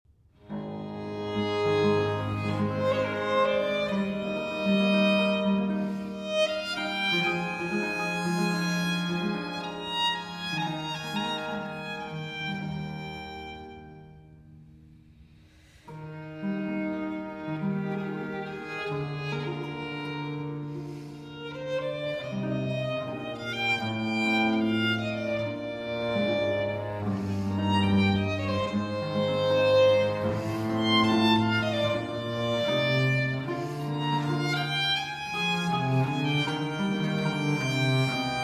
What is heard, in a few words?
Sonata For Violin and Basso Continuo in A Major I. Adagio; La Guerra